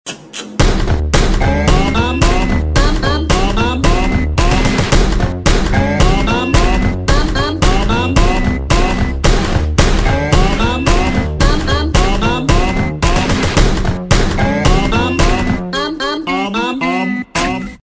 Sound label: studio loop